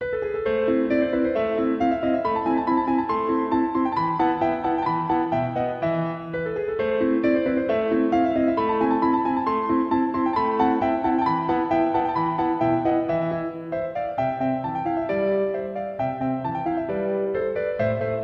Piano

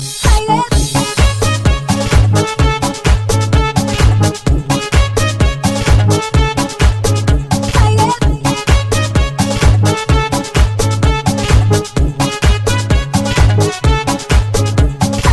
Sound Beat

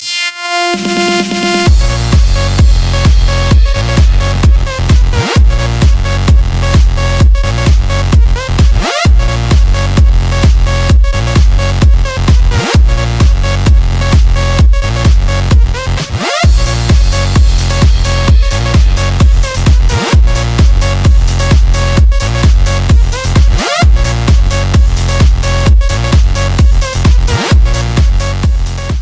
Electro

